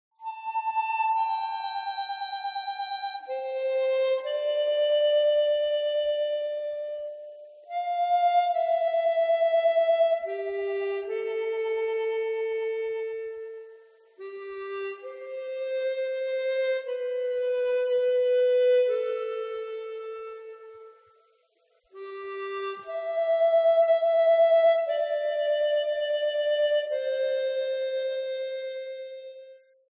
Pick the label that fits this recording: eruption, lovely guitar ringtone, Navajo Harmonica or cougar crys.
Navajo Harmonica